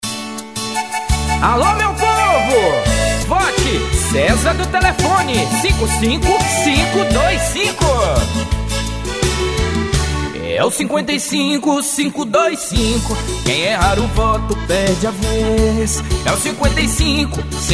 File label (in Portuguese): jingle parodia; para prefeito e vereador